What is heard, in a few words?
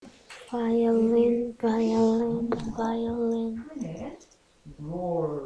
violin